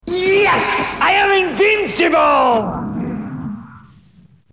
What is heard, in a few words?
VOCALS 110BPM G Major